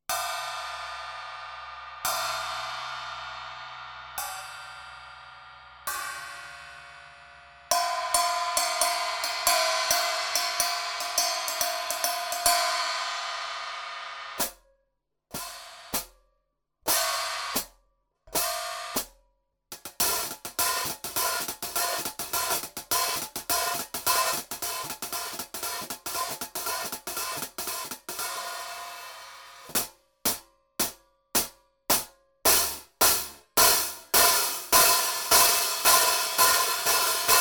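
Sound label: hi hat ISTANBUL AGOP TRAD REG 13, CYMBALES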